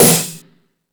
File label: Percussion FX, E-MU Pro-Cussion